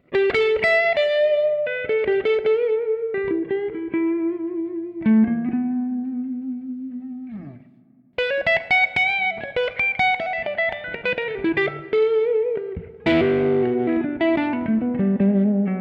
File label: Hagstrom Blues